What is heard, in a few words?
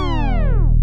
biup